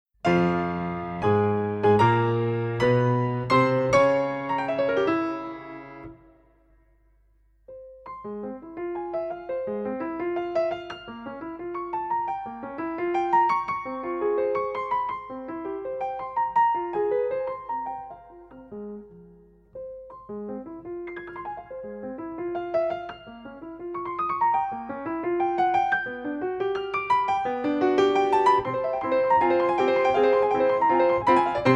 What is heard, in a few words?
Piano sonata Op.1 n.1 - Agitato